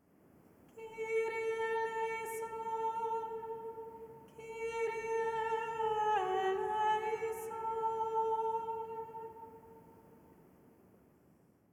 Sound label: bidding prayer response